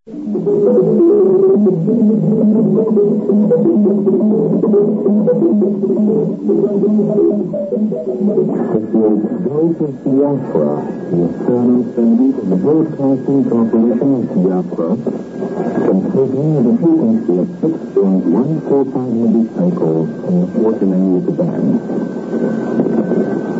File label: interval signal; Biafra; Voice of Biafra; c1970